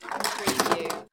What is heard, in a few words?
Pinball sound, ball rolls and rattles objects